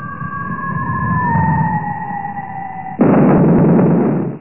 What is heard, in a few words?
bomb